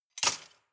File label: cutlery large multiple drawer place